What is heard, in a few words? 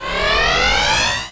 sc warm, sonic, weapons; zaero